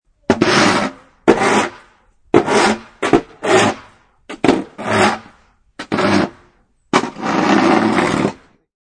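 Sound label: Scraping Snow Sidewalk, Loud intense scrapping of shovel on icey sidewalk.; Scrapping Ice Off Sidewalk; Yard Tools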